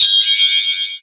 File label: Ice